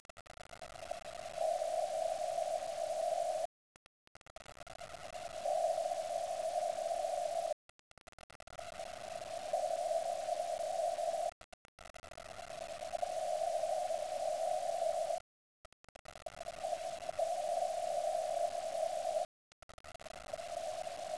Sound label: 40meter noise